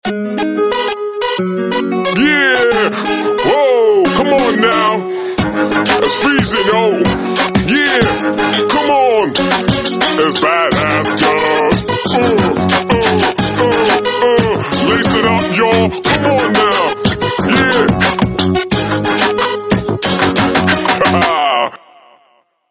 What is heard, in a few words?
Hip hop Guy; Samsung GT-S7520U